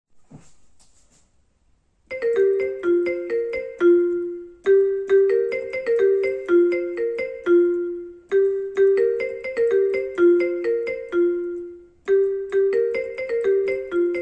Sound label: iphone ringtone marimba on piano